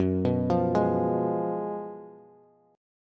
Gb Major 7th Suspended 2nd Suspended 4th, down, Bass; arpeggiated; JGuitar